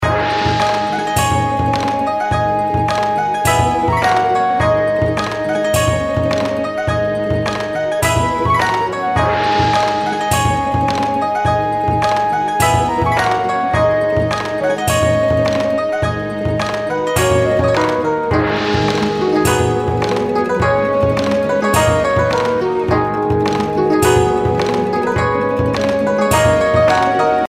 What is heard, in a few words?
reel spin; Princess Wencheng